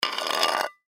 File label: Tin Can Scrape, Tin Can Empty Scrape Cement; Scrapes; Foley